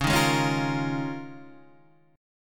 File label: Eb 9th Suspended 4th